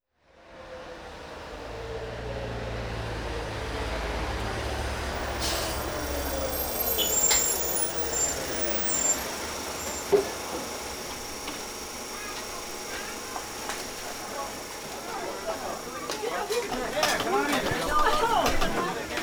Kitchen Racket

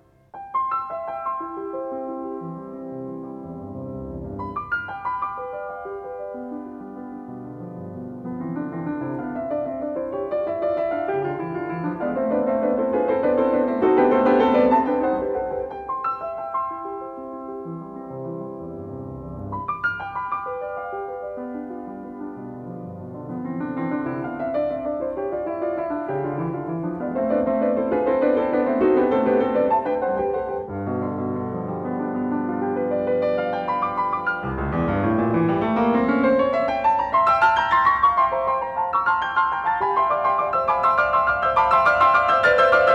Allegro, C major, piano; Beethoven; Diabelli Variations in C major, op.120; Brilliant Complete Beethoven Edition

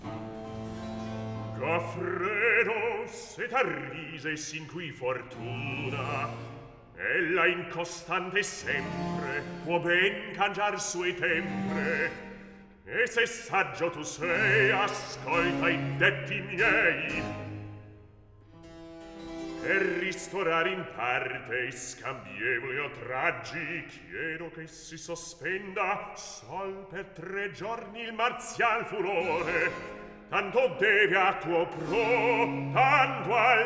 Recitativo; Goffredo, se t'arrise; Handel; Rinaldo; Act 1

